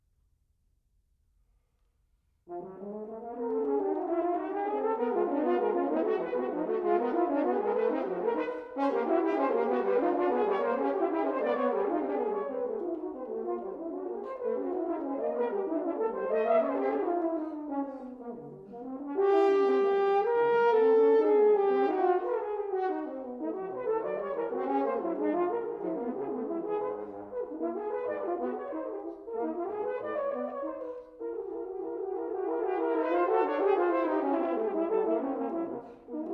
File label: Duets for unaccompanied horns